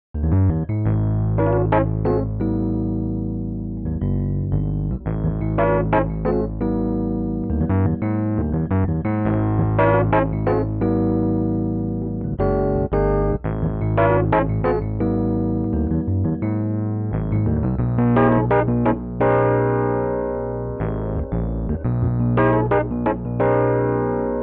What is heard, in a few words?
EP Bell OD; dexibell vivo s2